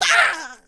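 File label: imp damage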